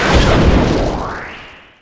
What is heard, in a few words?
massive blaster